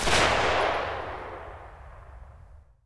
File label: m4 fire distant loop tail; Escape from Tarkov